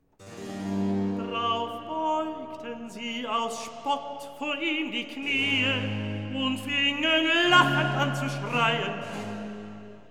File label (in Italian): Recitativo; Drauf beugten sie aus Spott; Handel; Brockes Passion